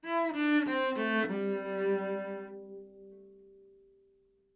live string phrases, string phrase Cmaj 100bpm

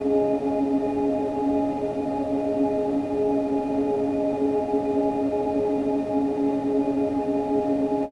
pad musician Db; synths pads; base pack

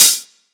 Hat

